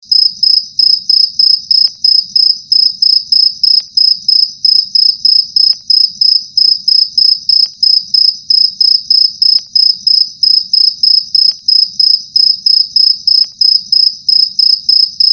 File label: soft night time nature music tone ringtone